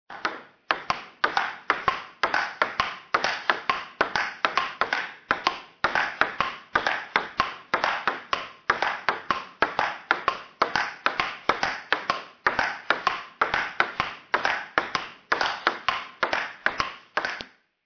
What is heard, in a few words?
Ping pong game